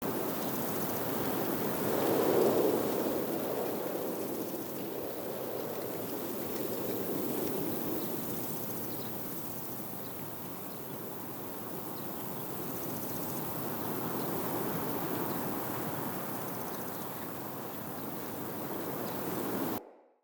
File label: Day Amb Loop, Ambience, Glades; Glades Of Andurin